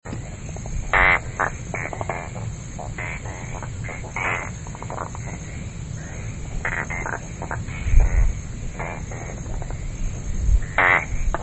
shiroagogaeru call, frog

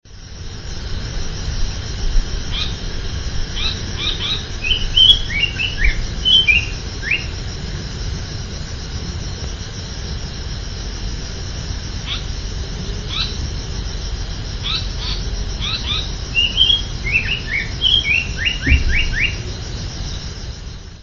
ryukyusankoutyou call; yanbaru

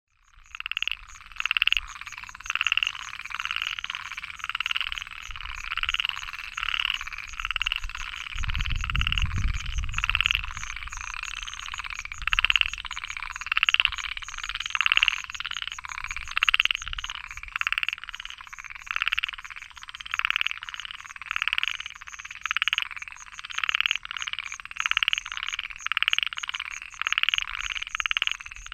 himeamagaeru call, frog

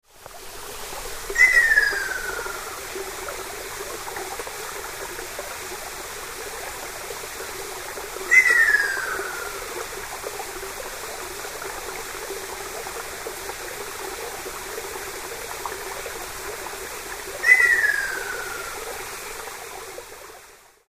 ryukyuakashoubin call; yanbaru

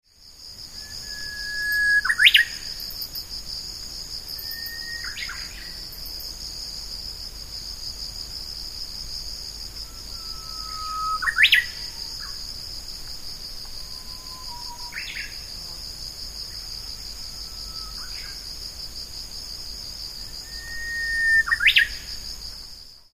uguisu call; yanbaru

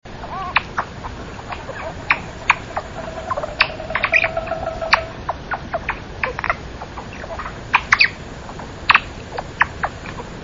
oohanasakigaeru call, frog

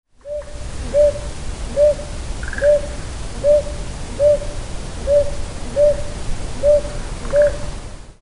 ryukyuaobazuku call; yanbaru

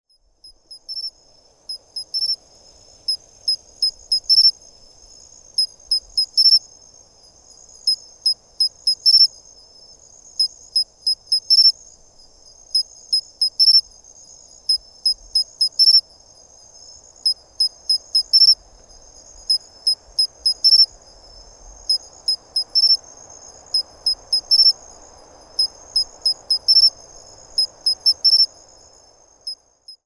matsumushi call; yanbaru

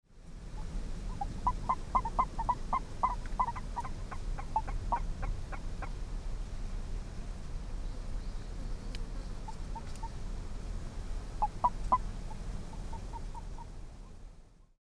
miyakohikigaeru call, frog

